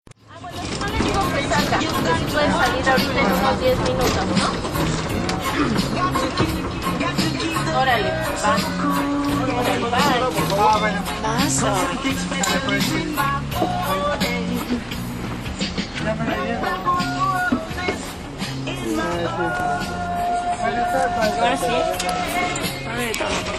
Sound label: reggae pesero